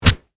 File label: Impact Punch Face, Impact, Punch, Face, Meaty, Flesh; Punches and Hits (Sound Effects:Combat)